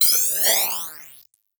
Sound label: Multimedia Internet CD-Rom Flash Wipe Cartoon